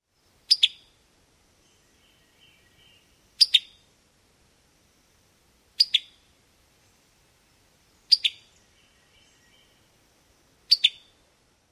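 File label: pitick call male, Summer Tanager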